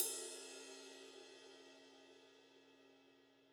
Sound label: Ride; apulSoft apTrigga2; Multi-Velocity Cymbal Samples